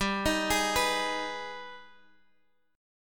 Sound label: B 6th G, guitar chord; B6/G; arpeggiated